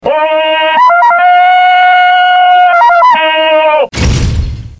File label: Aaah coo, cucù con gli animali